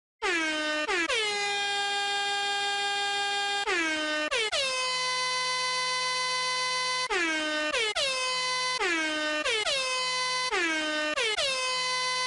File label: airhorn taps